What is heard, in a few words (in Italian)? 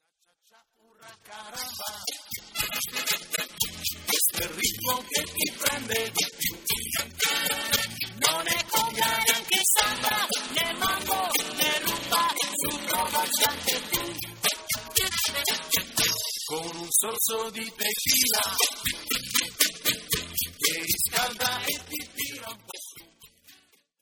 cha cha cha